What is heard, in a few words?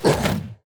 enemy specter death